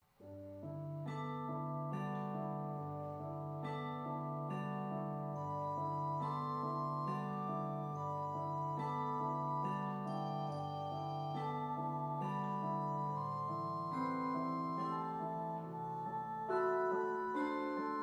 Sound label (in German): Melodram; DreiGroschenoper; rehearsal